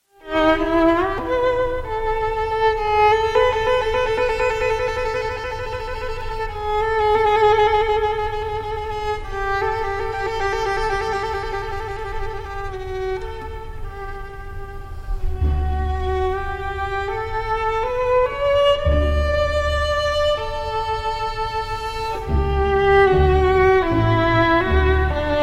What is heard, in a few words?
violin concerto in D major; ludwig van beethoven; op 61